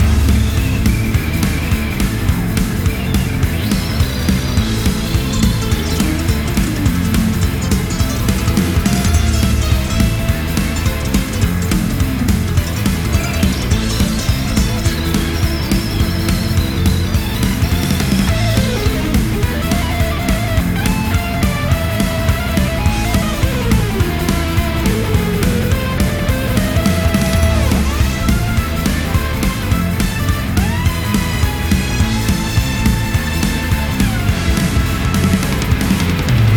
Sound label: Battle